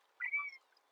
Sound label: Chirp